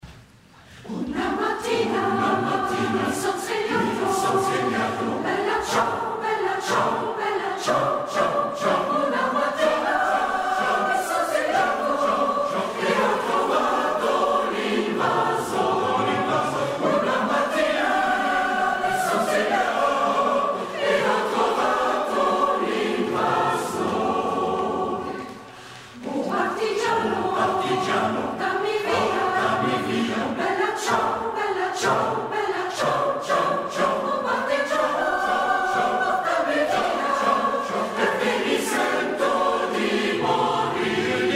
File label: Bella ciao Traditionale; Schlassconcert Saengerfreed